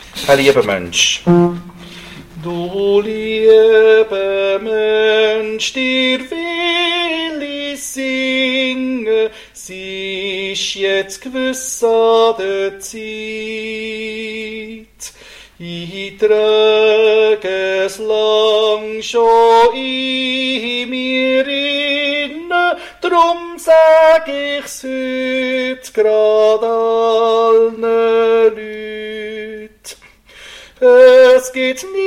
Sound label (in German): Tenor